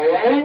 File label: cartoon strings